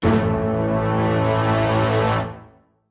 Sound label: orchestra hit melody; multisampled